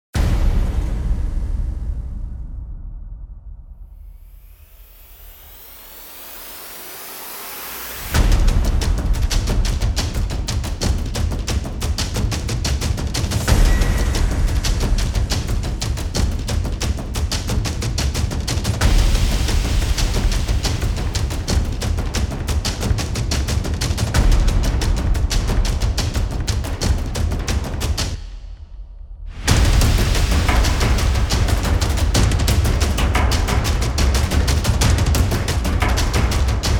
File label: Percussion; Fired Earth Music